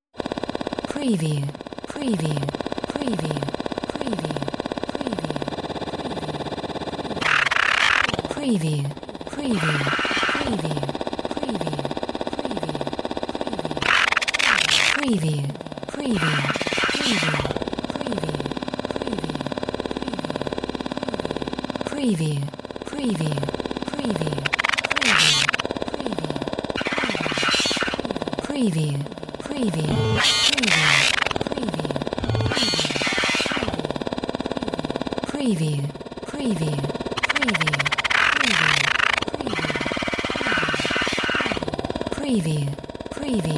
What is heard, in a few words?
SCIFI MECHBOT